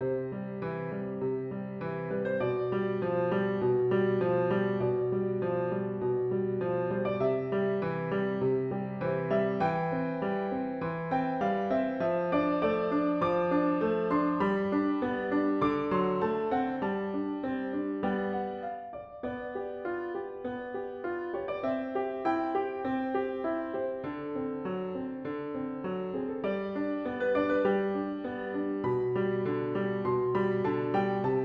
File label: Piano